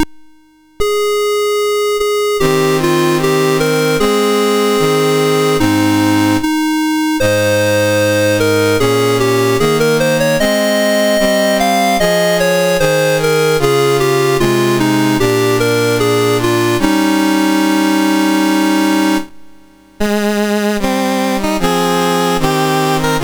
MOS6581R2, National Anthems; Johore

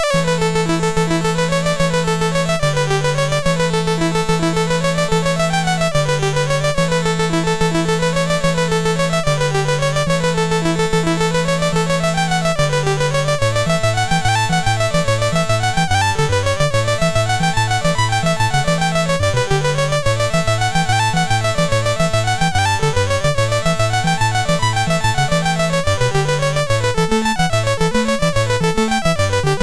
Mooncoin Jig, MOS6581R2

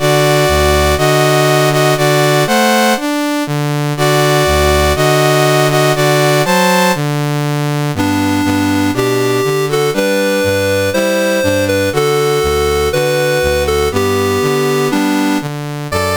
CSG8580R5, National Anthems; Monaco

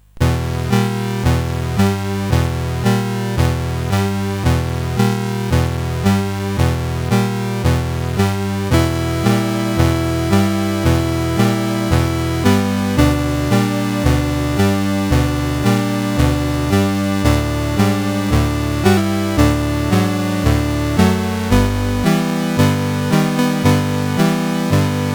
Prelude in A Minor, CSG8580R5; Op28 No2

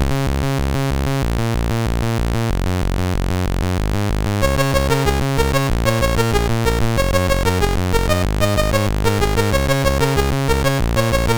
Intro sid MOS6581R2; Infiltrators

